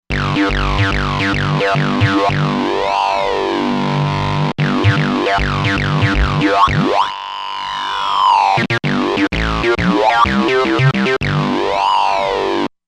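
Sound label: Lead HPF Attack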